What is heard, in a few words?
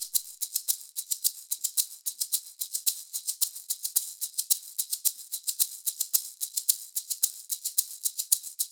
maracas loop, 110; perc construction loops; SEISMIC Drum Sample Pack; Slate